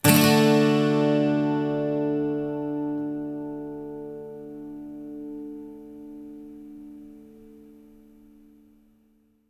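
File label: 12 string major D, Electric & Acoustic Guitar Loops; USB Soundscan vol.04; AKAI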